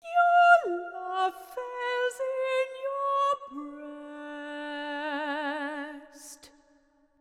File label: Hush, Lullay, vocal phrase